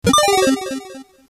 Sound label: timeout